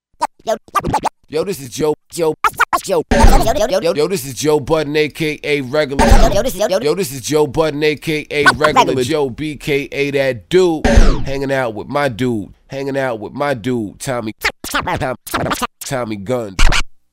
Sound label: SKRATCHED Drop